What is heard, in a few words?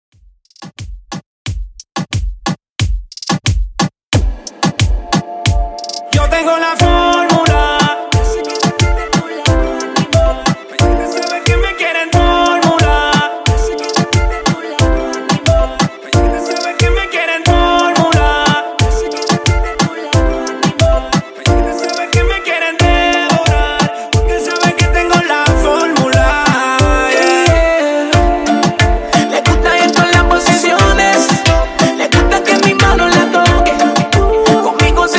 Latin Reggaeton 90 Bpm